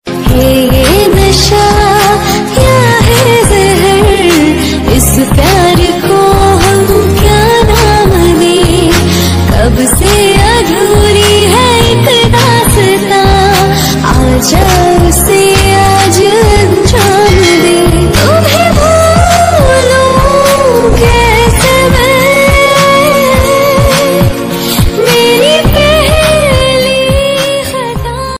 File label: Romantic Hindi Female Song Ringtone